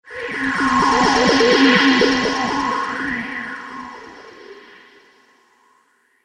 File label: Whoosh